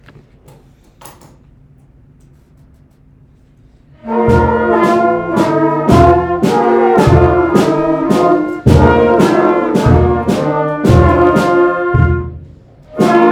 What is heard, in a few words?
MarchMilitaire; Spring Songs 2021